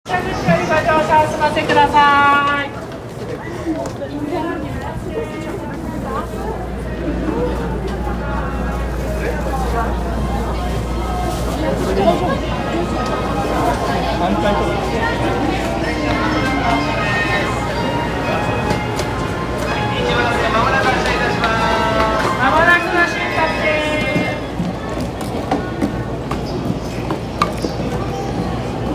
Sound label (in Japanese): 東海道線 平塚 北, train sounds